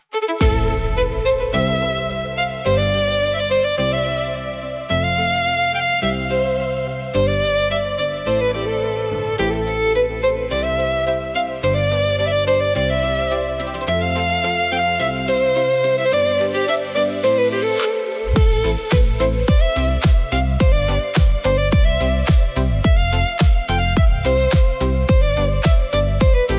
Murmur In Glass, spiritual spooky soundscapes

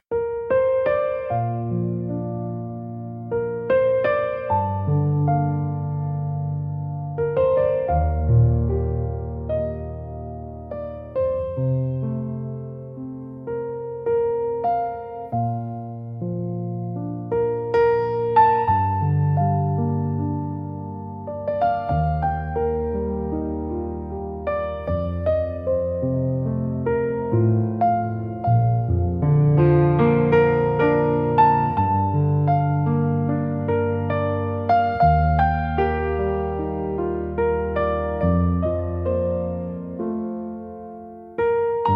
fantasy music nature forest

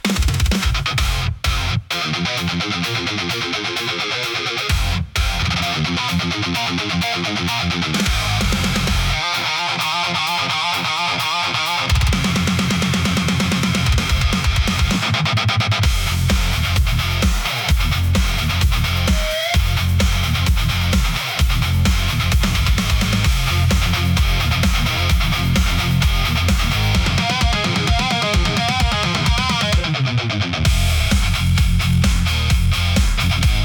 hard rock for powerful

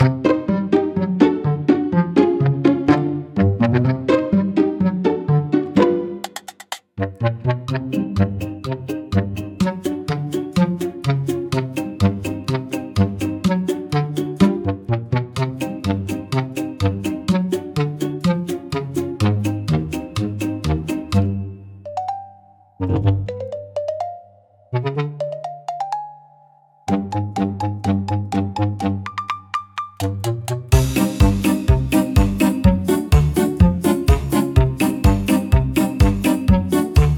Marimba Muddle, comical marimba for funny scenes

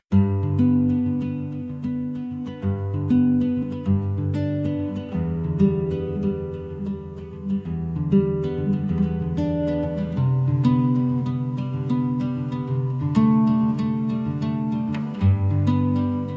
acoustic chill

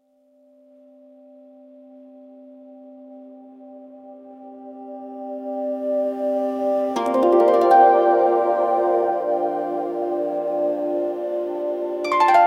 quiet japanese ambient

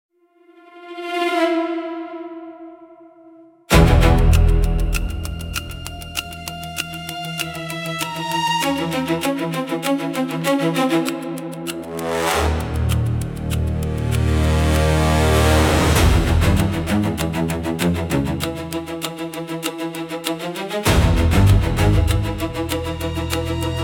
high tension suspense

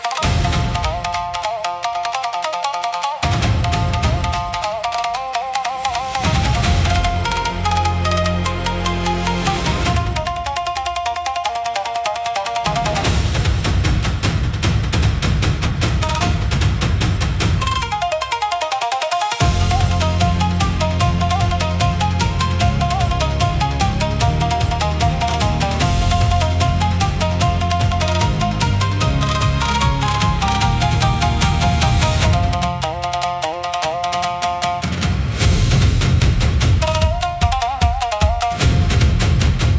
Thunder At Dusk, cinematic japanese style battles

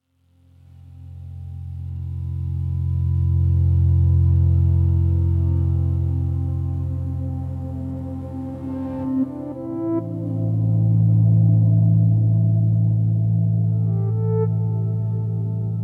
Phantom Glass Room, spiritual spooky soundscapes

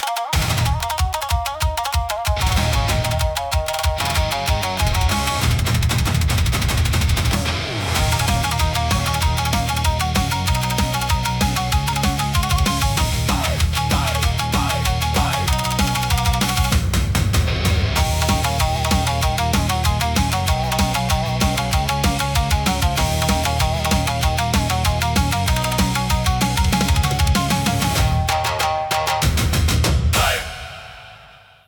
Kage Run, intense japanese rock

